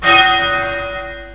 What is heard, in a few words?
Bell; OldBells